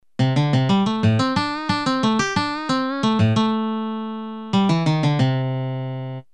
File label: lick